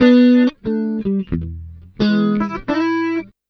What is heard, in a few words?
Blues, Electric & Acoustic Guitar Loops